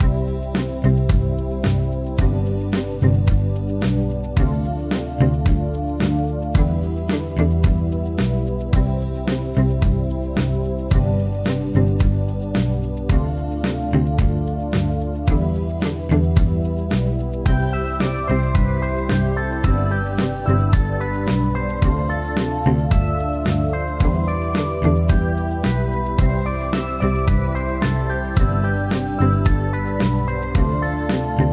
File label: 80s Payphone; 80s Music Pack Vol. 2